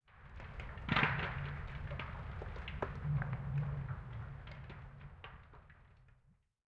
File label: ambient; World of Tanks